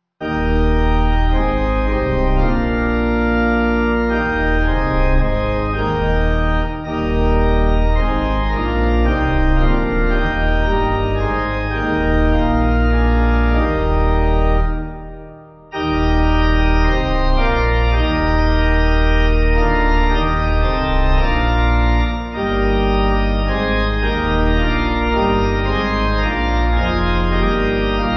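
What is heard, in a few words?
Pipe Organ; Kripplein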